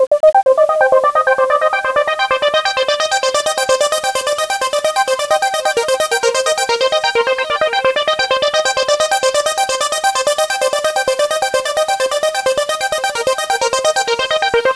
Synth Arp; Hip-Hop Street Beats Construction Kit; NY Dance

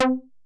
SEQUENCE C4; SEQ AND PERC; Music Store - Claudius Diemeter Schwerlos

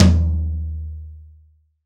TOM, Drum Kit, DRY KIT; AKAI S6000 CD-ROM - Volume 3

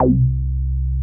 Moog C2, analog bass; Music Store - Claudius Diemeter Schwerlos

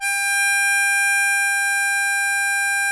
HARMONICA G4; Sonic Reality; American Heartland

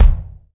Drums Acoustic, RAW KIT; AKAI; 90s sample CD; USB Soundscan vol.10; RAW KIT 6

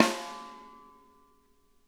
SNARE

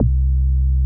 MOOG LOW C2, ANALOG BASS; Music Store - Claudius Diemeter Schwerlos

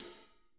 hats, Drum Kits; Sonic Reality

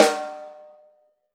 RAW SD, Drums Acoustic; USB Soundscan vol.10; AKAI; 90s sample CD; RAW KIT 1

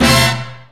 Brass Hit; Proteus 2000; Multisamples; Digital Sound Factory Proteus 2000

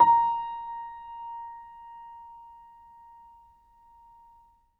Acoustic Piano, piano stereo; InVision Interactive; Miroslav Vitous

